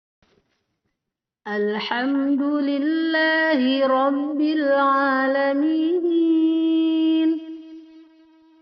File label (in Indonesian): Perekaman baru Karaoke denoised